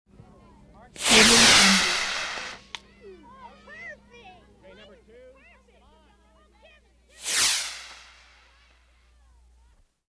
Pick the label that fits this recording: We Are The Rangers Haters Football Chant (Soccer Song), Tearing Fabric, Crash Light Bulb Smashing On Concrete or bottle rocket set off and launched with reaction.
bottle rocket set off and launched with reaction